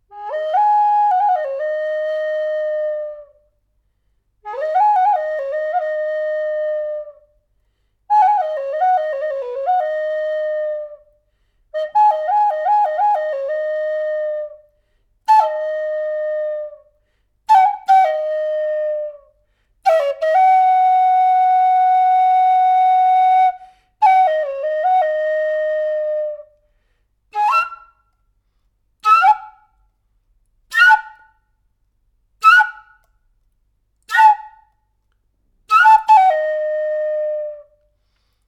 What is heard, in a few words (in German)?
Flöte NAF art Stimmung Gis 440 Hz